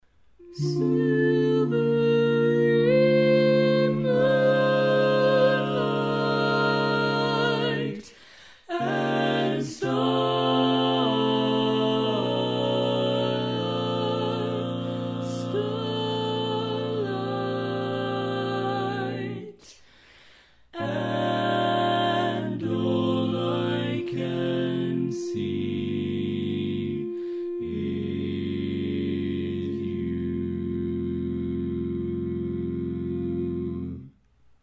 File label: 5 Part, All Parts, barbershop tags